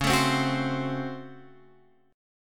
Db Major 7th Suspended 4th Sharp 5th Db down Guitar Open F; strummed